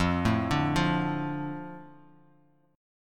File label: F 6th F Bass Standard; F6 arpeggiated